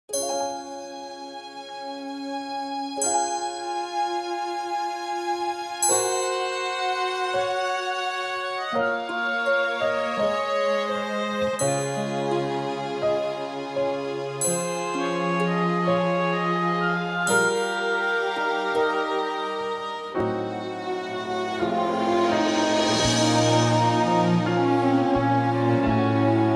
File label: baltimore reprise accompaniment